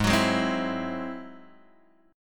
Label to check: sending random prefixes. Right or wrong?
Wrong